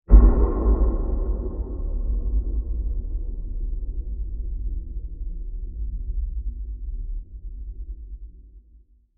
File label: Low Breathy Impact; Low Impacts; CraftedSignals